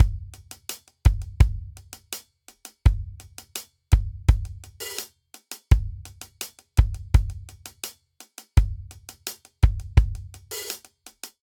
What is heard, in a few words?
Straight Eighths Rock Breakdown 84 bpm; MIDI Clips; Ableton; Factory Packs; Drum Booth